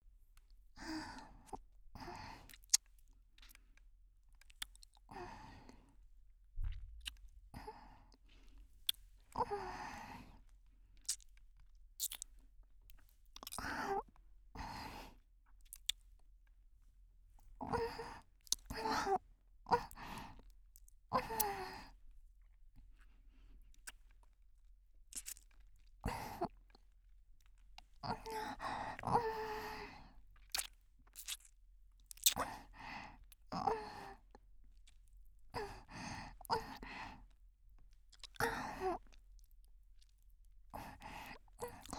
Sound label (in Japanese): キス; マゾハーレム